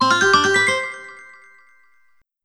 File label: correct answer reward; mixkit